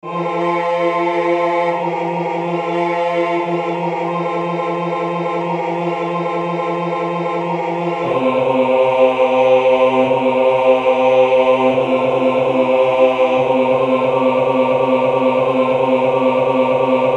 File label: De Colores basse